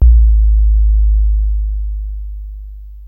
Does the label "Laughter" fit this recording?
No